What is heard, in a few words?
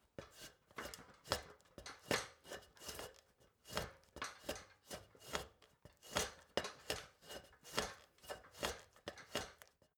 step ladder metal scrape; schritte